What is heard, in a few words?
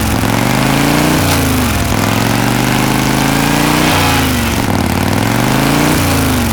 vehicle moto move; mohaa